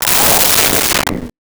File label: Shuttle Fly By, Science Fiction